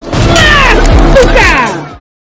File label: knife death